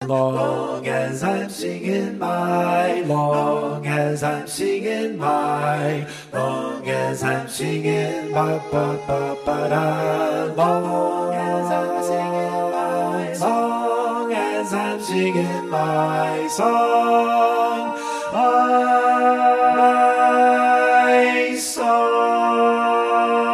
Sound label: Bass, barbershop